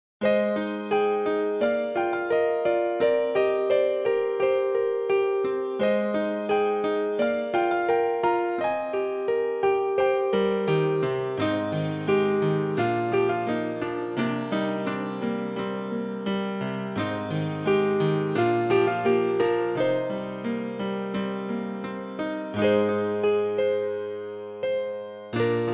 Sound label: piano